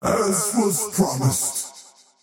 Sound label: death; dota; faceless void